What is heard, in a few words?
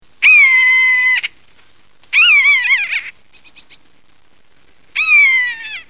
Buteo buteo